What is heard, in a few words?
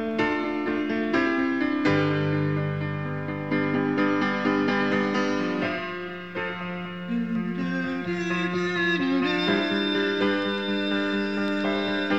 Unused Piano